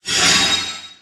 Spell Activation Sound

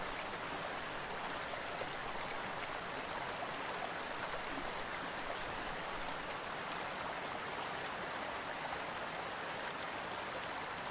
Waterfall Ambient

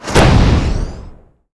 Dark Magic Spell Impact

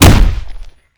Punch Hit

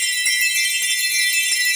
Magical Energy Loop

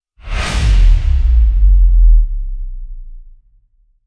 Rise and Hit

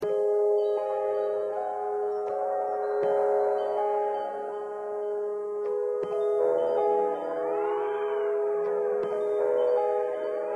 distorted reality

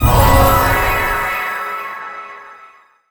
Magic Spell Impact; FiE-Game

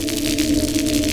Magic Electric Energy Loop

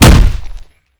Punch Hit

